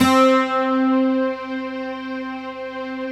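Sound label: C4, GUITAR STRINGS; Best Service; Advanced Media Trax Modern Composer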